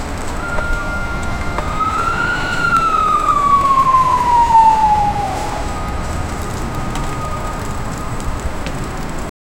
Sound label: environmental fx distant, bats